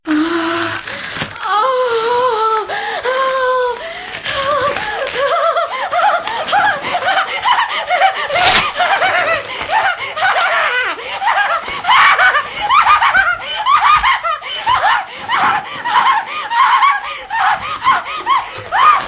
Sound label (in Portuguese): risadinha gostosinha